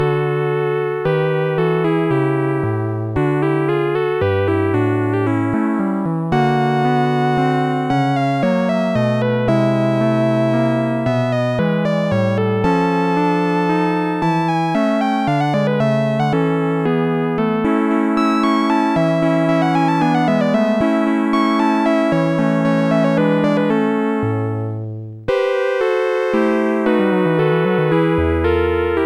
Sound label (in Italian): menuetto; Sonix Music Driver